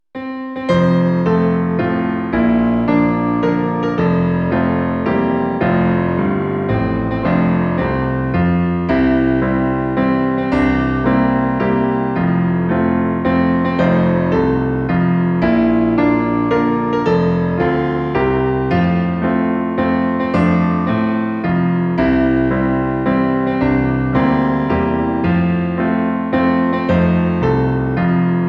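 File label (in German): Zum Geburtstag viel Glück in F Piano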